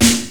raw acoustic snare b key

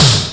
dry steel snare drum sample g key

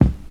HQ good kick drum sound F key

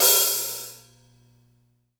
long tail reverb open high hat sample g key

